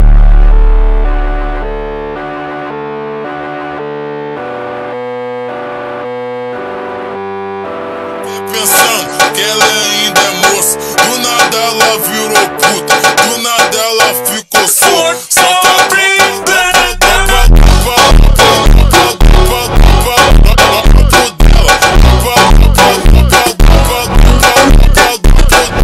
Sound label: funk slowed